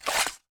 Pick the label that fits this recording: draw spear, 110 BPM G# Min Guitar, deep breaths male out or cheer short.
draw spear